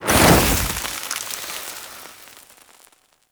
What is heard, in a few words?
Fire Death Burst; Warframe